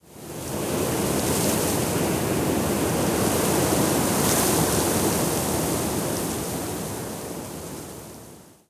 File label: Ambient